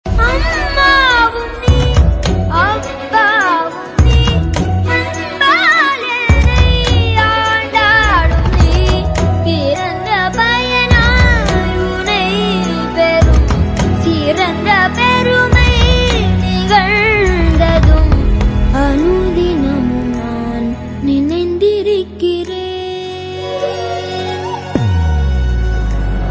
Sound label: Female